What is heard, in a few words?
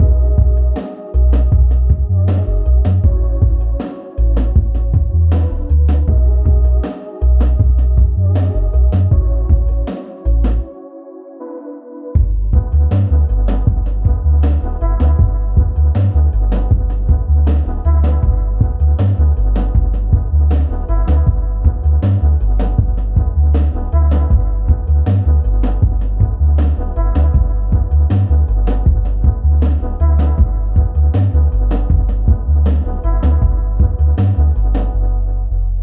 alto; ゆめ2っき